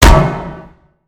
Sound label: Robot Get Hit Dry; creatures mechanical; Lego